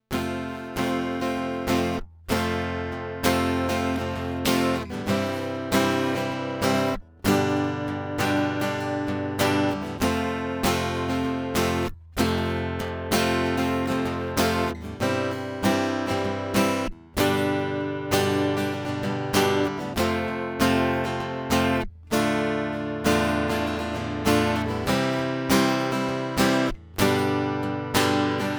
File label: piezo strummed; Baton Rouge